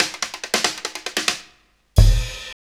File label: Fills; AMG; Now CD-ROM (Roland)